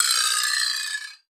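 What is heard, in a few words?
VIBRASLAP, Drum Machines; EdgeSounds; Drum Mashines VOL-1; X-DRUMS KIT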